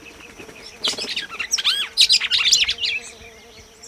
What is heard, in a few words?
paroaria dominicana